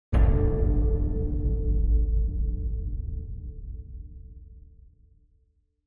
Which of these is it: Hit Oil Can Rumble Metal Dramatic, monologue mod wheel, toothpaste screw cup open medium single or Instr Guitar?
Hit Oil Can Rumble Metal Dramatic